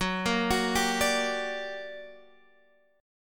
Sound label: G Minor Major 7th, Gb, guitar chord